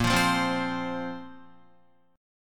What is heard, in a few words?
Bb Suspended 2nd, Guitar; strummed; chordshape